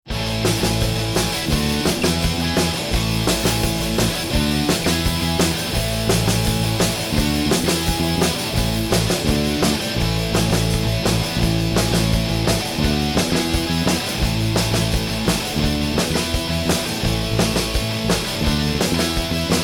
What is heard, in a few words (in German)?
Marsch; rockpop